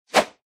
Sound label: jump; cowboyrun